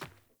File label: Sandals Run Dirt, Footsteps